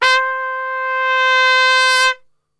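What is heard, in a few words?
C 3 trumpet; Best Service; Brass Super Section; TRP SWL; 90 s Sample CDs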